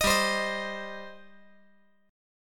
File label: G Suspended 4th Sharp 5th G, down